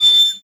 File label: move, hinges drawers; Half Life Alyx; tap